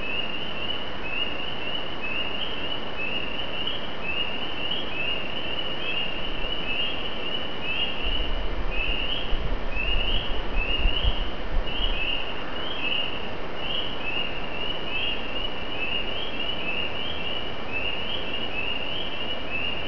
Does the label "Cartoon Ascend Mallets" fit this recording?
No